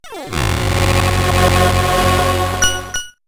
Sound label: Logo; Lucasfilm